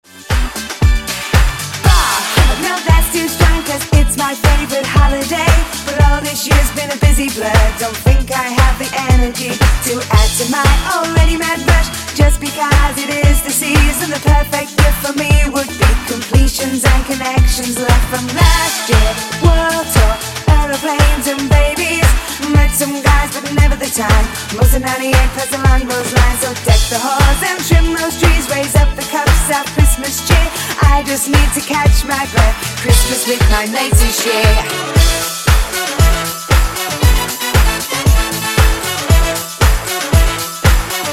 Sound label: Xmas